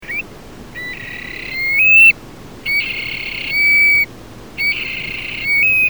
Burhinus oedicnemus

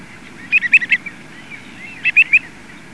Xenus cinereus

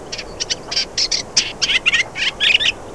Hippolais pallida

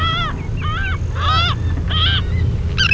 Larus audouinii

